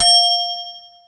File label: Bell